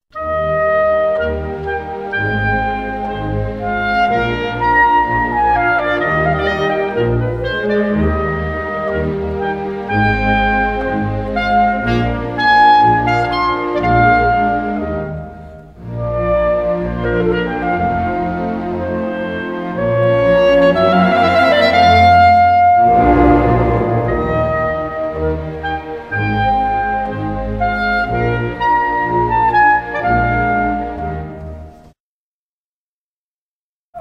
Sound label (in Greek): Κλαρινέτο Andante